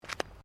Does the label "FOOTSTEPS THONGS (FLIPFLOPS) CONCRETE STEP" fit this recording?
Yes